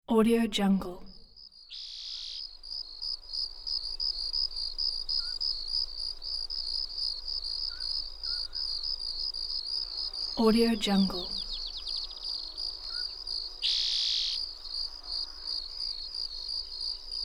crickets chorus

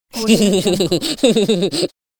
cartoon fox giggle; fxprosound

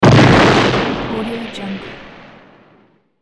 explosion powerful blast; hollywoodedge

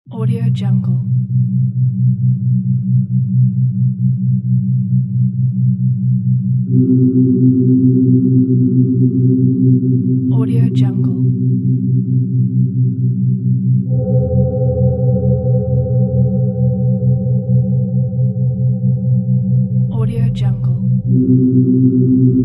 dark soundscape; wildemotion

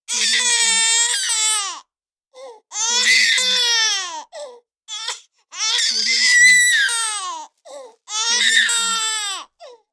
newborn baby crying

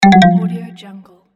game negative

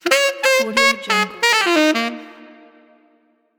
saxophone

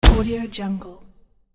gun shot single silencer shot bottom end

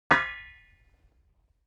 object metal impact pipe

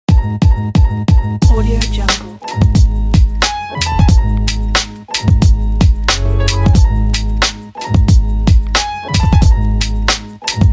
hiphop instrumental background

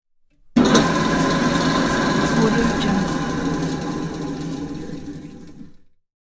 drill press

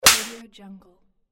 slap

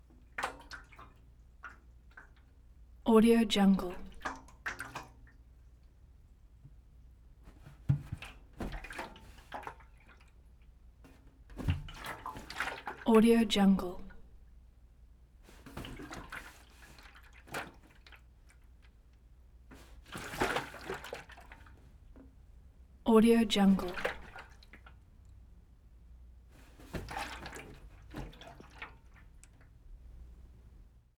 water jug movement hollow plastic

